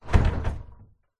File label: household garage door wooden closed impact rattle; zapsplat